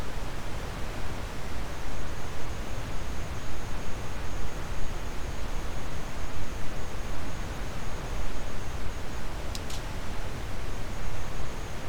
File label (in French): cachalot positif, Physeter Macrocephalus